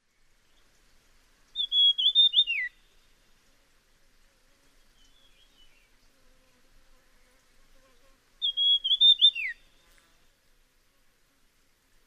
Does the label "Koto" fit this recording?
No